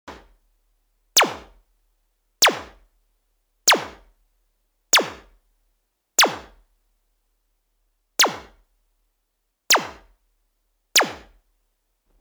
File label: Disco clockwork triggered